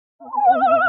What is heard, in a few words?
Crying